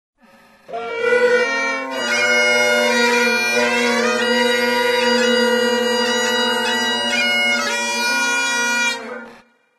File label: badpipes, taunts; Source Engine; tf